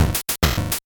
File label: 8bit se